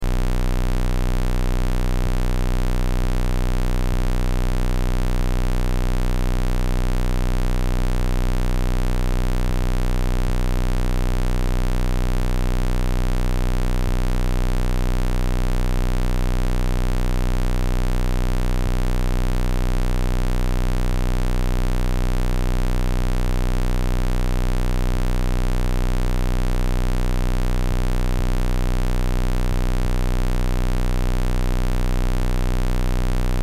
Test Tone Square; Square Wave 31.05Hz 60 Secs -18Db Loop; Tone Sound Effects; Blastwave; Technology

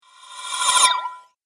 Future Weapon Swipe

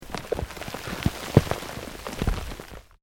EARTH LOOSE MOVE LONG, Debris; Material components for constructing more complex sounds; Materials

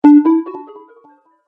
Magic Interface Sound; Magic Sound Effects

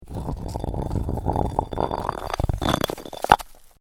Medium Rock Slide Scrape Then Impact; A stone grinds against uneven rock surface; Rock Sound Effects; Lynne Music

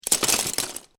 Lego Drop Several Pieces Into Lego Box; Miscellaneous Toy Sound Effects

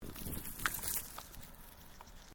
Animal Alpaca Female Eating; Alpacas on a farm in Gippsland Victoria; Miscellaneous Animal

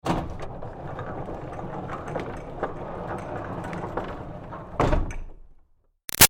Door Barn Rolling Long Roll Slow; Barn Doors

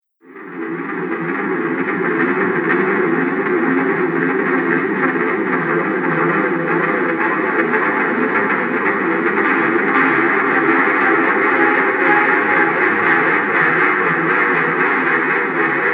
Resonant Movement Reborn; Sci Fi Sound Effects; Horror Sound Effect; Andrew Fleming

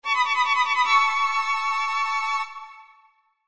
Accent Violin Trill, Accent Violin Trill Chord; String; Blastwave; Sound Effects:Musical

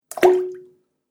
Water Very Hard Plop; Dripping Sound Effects; Water Sound Effect - Splish, Splash, Splosh!; Andrew Fleming

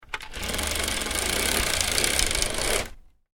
Roman Blind: Domestic, Roll Up With Cord; Miscellaneous Household

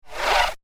Science Fiction Robot Pulse; Andrew Fleming

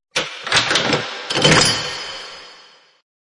Scifi Production Element Space Rattle; Scifi Production Element - Space Rattle. Suitable as science fiction film and game production; Science Fiction Sound Effects; Lynne Music

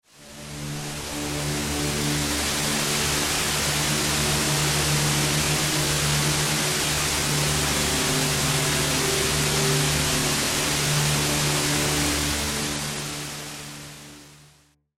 Whitenoise Dark Scifi Synth; LYN115 Trailer Production Elements

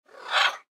Bird Raven Screech; Squawk; Bird Sound Effects; Animal

